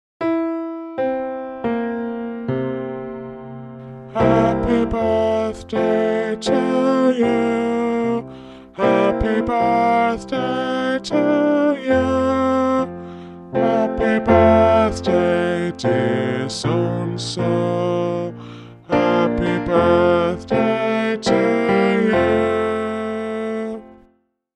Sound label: Happy Birthday Tenor